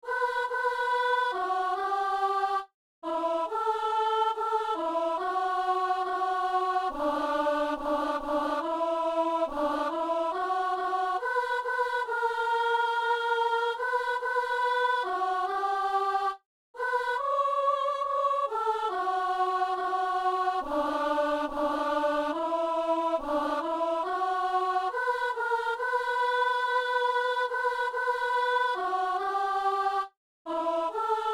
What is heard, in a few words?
soprano; Messe du 17 janvier à Saint-Denis